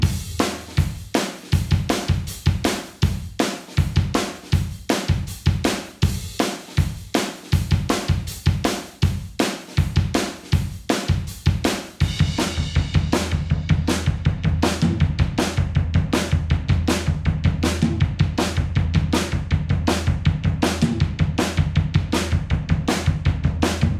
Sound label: 160bpm Drum Full Intro; hot lava; ios macos windows